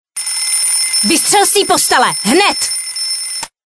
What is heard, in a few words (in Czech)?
vystrel z ty postele